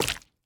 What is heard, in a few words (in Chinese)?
蜂蜜块 破坏声